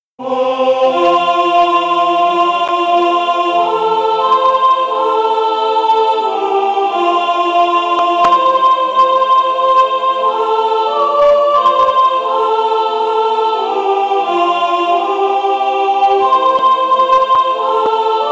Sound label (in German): sopran; generationenchor